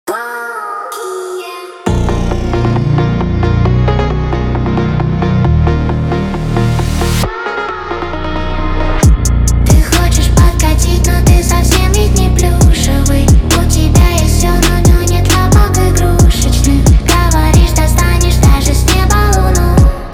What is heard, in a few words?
phonk remix